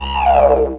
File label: Didgeridoo; qubodup; pitchglitch